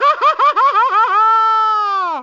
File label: burning sfx game; Mario 64